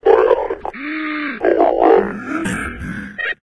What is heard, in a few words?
zombine idle; npc; source engine; episodic